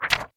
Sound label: Paper